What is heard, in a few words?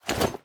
equip iron, armor; Minecraft; neoLegacy